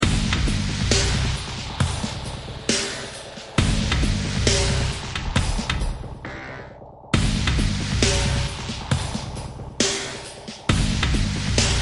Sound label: music home; FoosBall